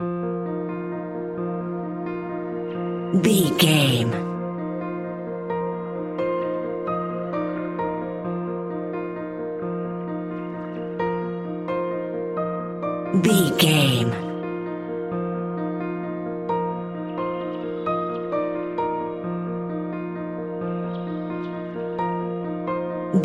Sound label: wondrous tranquility loop